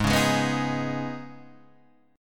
Eb 7th Suspended 4th Sharp 5th Ab

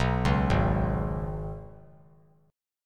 Ab Minor Double Flat 5th down; Abmbb5; arpeggiated

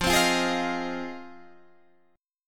F Minor 7th F Guitar Standard; strummed; JGuitar

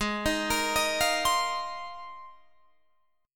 Ab 6th Add 9th Ab Guitar Drop D

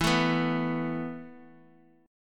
E Suspended 4th E, down; Esus4 strummed

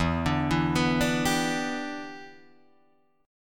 E Minor 7th, Guitar, Drop D

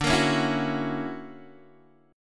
D Augmented Major 9th down Guitar DADGAD

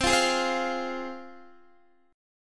C Suspended 4th Sharp 5th, C, down, Guitar; Csus4#5 strummed

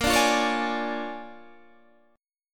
Bb 7th Sharp 9th Bb, Guitar Standard; Bb7#9; strummed; JGuitar

